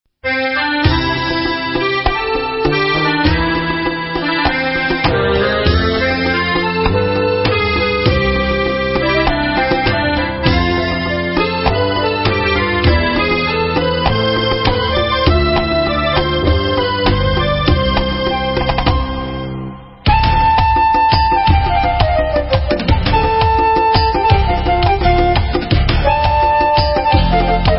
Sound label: Debka Instrumental